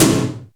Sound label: doom tom, Roland R-8